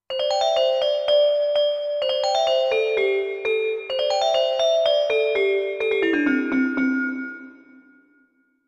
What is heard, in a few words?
Tinklebell